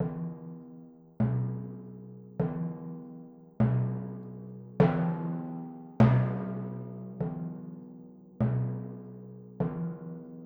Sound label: Timpani, Orchestral; Sonic Foundry (Sony Creative Software); Orchestral VOL-2; Modern 02